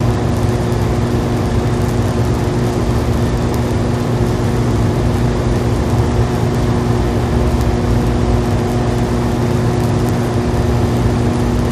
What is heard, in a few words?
Power Plant Transfo, buzz & hums